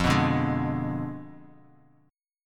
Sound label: F Diminished 7th F down